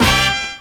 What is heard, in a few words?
HORNHIT, HORN STAB; E-Lab; X Static Goldmine 2